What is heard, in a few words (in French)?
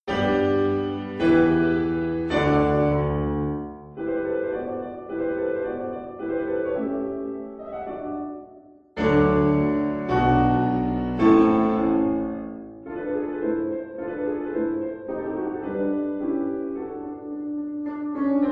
Chant d oiseaux; Vasseur Leon